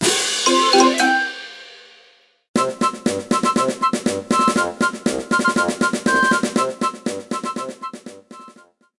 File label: Skill Game Complete; PokePark Pikachu's Adventure; Wii